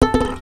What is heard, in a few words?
Violin Extended Technique